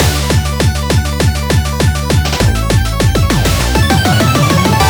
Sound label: Bgm Intro Stereo; the tawashi